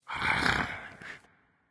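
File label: Movement Purr; smite; god bakasura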